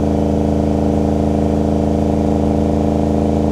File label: Truck Dodge Ram Loop; Trucks